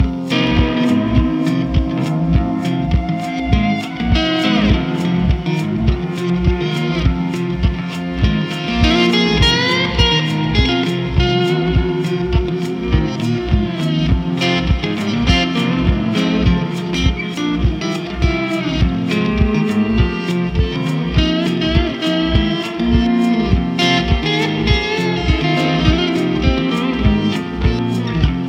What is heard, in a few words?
triple guitar